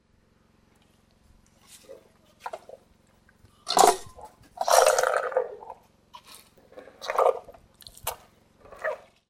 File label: eating cucumber